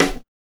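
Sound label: HSE-SD, house snare drum; X-Static Goldmine 1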